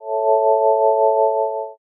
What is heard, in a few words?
magic circle